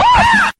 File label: "hoha", voice